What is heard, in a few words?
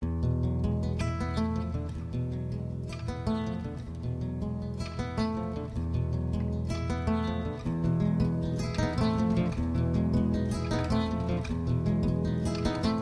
Guitar